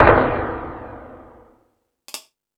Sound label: FX Sounds, Drum Machines